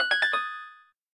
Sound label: harp, keytone